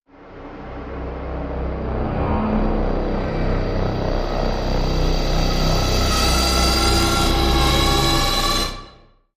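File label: Whoosh; Extermination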